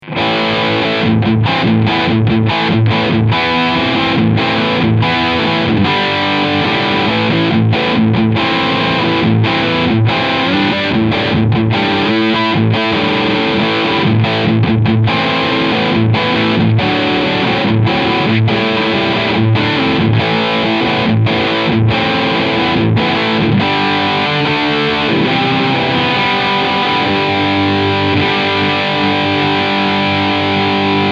Gibson Les Paul Tribute STB High Gain Riff Steg PU